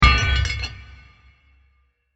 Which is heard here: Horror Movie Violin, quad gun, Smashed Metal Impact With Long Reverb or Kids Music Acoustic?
Smashed Metal Impact With Long Reverb